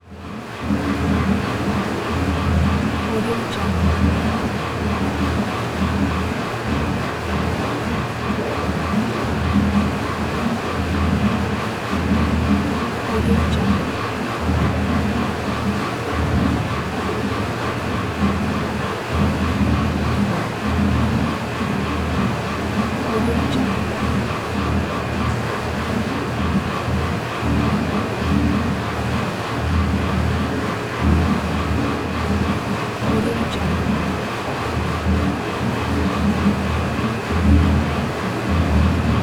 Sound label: boat idle